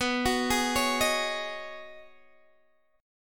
B 6th Add 9th B down Guitar DADGAD

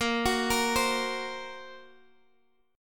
A sharp Suspended 2nd Sharp 5th; arpeggiated; x,x,8,11,11,8; JGuitar

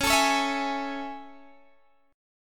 A Major 7th C sharp

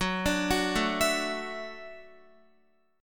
F sharp Minor 7th Sharp 5th F sharp, Guitar; F#m7#5 arpeggiated; x,9,12,11,9,11

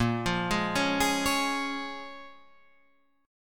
Bb Minor 7th, Guitar, Drop D

